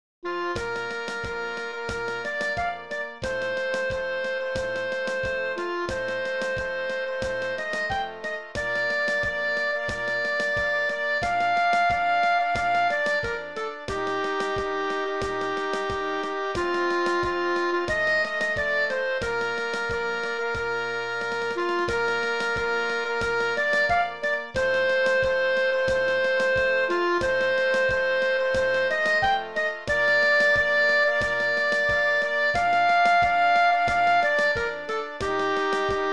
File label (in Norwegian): klarinett